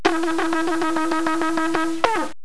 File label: teruah